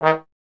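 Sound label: Brass Trombone D#0; Miroslav Vitous - Solo Instruments VOL-1; 90 s Sample CDs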